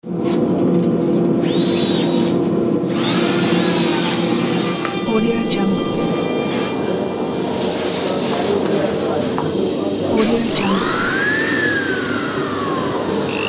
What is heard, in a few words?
factory ambience